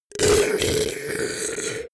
beast choke deadly

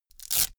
velcro large open quick

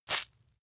leather impact mud medium squeeze

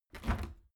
washing machine tumble drier door open

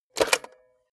cassette player slide tray take out hard

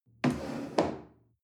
chair wood place slide soft

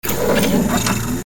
electric door

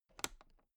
toolbox latch close loose soft

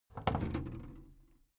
big plastic case lid lift soft

